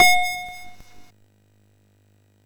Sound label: SFX, MOS6581; Narco Police